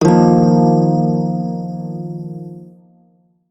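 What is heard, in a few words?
Prime Shawzin Pentatonic Minor Chord, Music; Warframe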